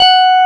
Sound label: Korg CX3, F#5; Back In Time Records; Korg Universe VOL-1